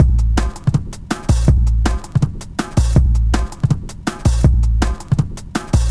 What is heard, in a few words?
162, breaks, Loops; M8